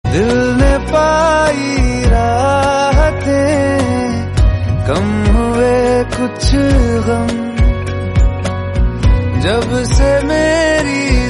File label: Hindi